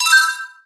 hit sound

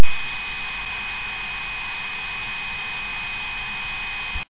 very peaceful and calm

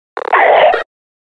hit; combine

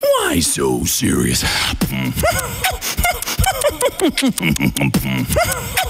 beatbox short

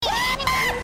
wwwwaaaahhhhhhhh

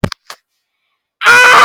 hit scream

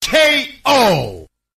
ko sound effect

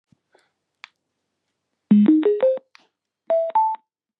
speaker chime; squba

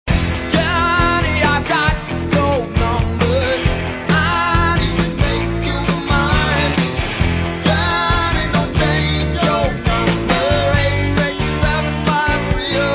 music

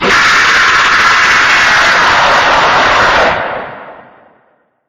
jumpscare sound; chucks holiday horrors

